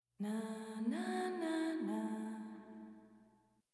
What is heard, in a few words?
hymn; Mirror's Edge Catalyst (2016)